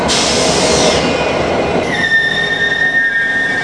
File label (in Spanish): freno